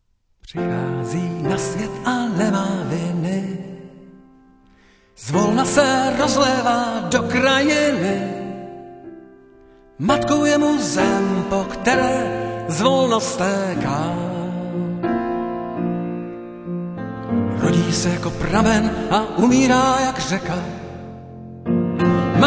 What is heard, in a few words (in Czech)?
klavir; ustredni pisen